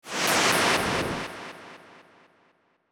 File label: sfx attack